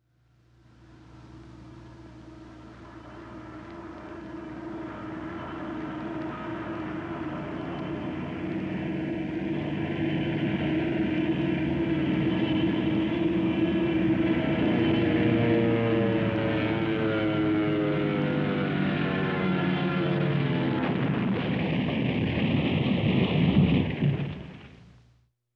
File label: Plane Crash; Stereofect